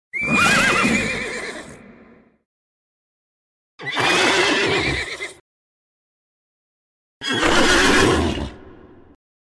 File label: sms funny horse